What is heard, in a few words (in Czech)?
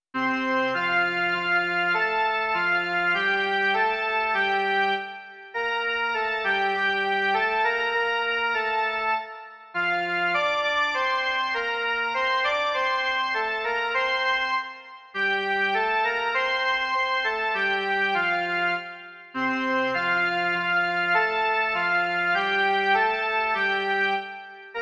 jen melodie; Kdo hledá sobě přítele